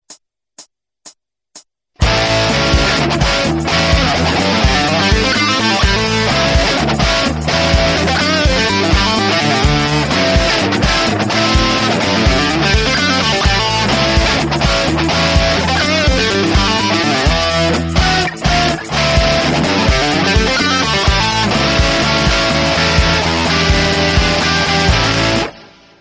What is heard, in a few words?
blues